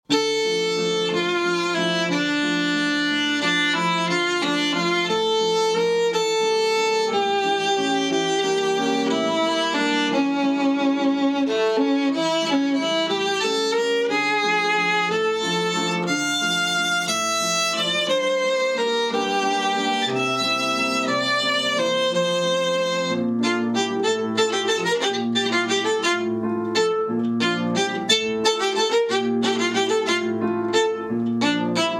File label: Beginner Play Along, violin